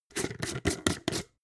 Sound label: jar glass plastic open fast